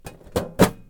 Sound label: close metal tool box